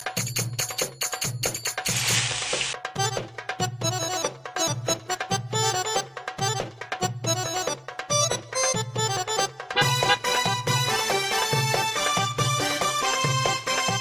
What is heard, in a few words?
arabic mix; hip hop